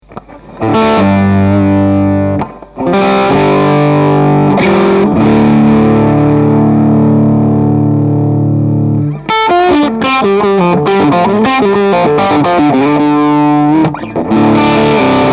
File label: Tone King Sky King lead channel full gain triode